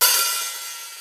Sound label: HH OP, DRY; Crypton - DrumBase5000; DRY KITS